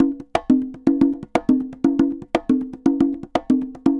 Congas Samba 120 bpm; Best Service; Festa Latina VOL-1

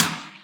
Percussions

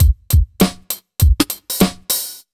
100 BEAT, Pure Electro; USB Soundscan vol.03; AKAI

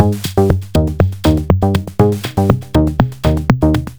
120 Breakdown; Zero-G; Soundclash Nu Dance Electro; Acidized Wavs; Combi Loops; 120bpm

